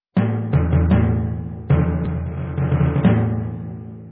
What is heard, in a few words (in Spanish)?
timbal